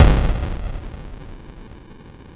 Crushed Perc, bitcrushed; musicradar

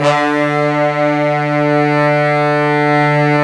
BRS, Pop Section; Roland L-CD702

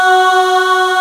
AAH F#3, POP CHO

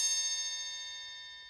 Triangle, Orchestral Percussion One Shots; Sonic Foundry (Sony Creative Software); Orchestral VOL-2

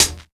HAT 3; East West - Phat & Phunky CD2; 90's Sample CDs

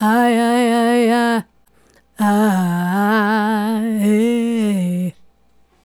Female Solo, Hip-Hop and RnB Vocals

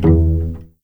E1 PIZ CEL, string; East West; The Ultimate String Collection

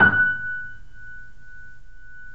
F#, Piano; InVision Interactive; The Piano

